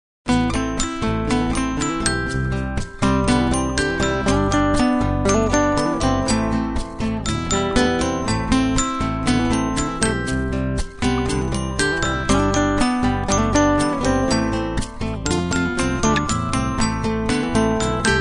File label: happy jingle